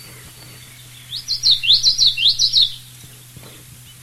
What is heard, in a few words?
yellowthroat, songs